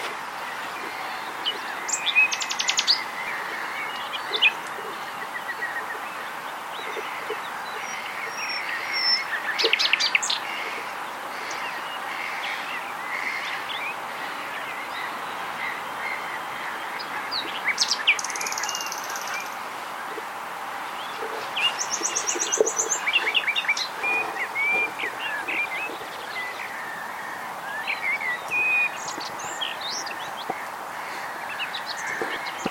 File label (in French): rossignol etang; coq